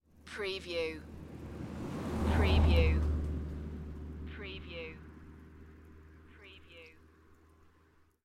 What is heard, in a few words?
Car drive by fast on country road in Wales; beanstalk audio